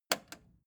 record player repeat jump out click slow

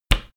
card flap

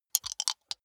big two rattle in hand closed short

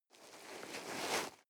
nylon leather soft

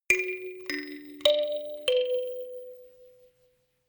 notification kalimba rattle sequence minor

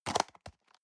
plastic box food small close hard

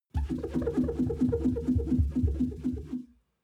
clean window paper towel rub

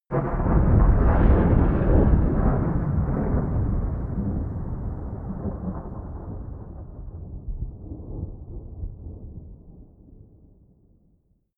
generic thunder oneshot distant

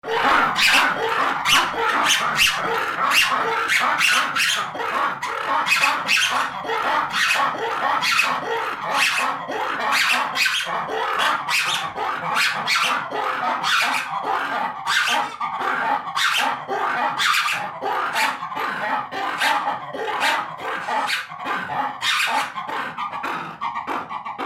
monkeys in the zoo

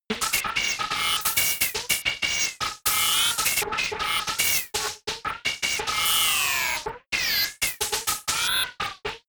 sequence crazy noise percussion lead unstable

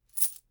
chain mail armor movement

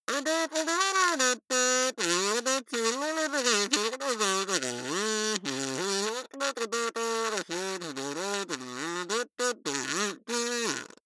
kazoo talk pratte long

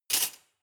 cutlery large drawer place hard

